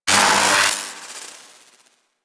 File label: Luck Activate Electricity; Warframe; Warframes; Dragon